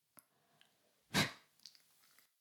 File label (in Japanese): 耳舐め音声 (LR逆); 古き城のドラゴニート2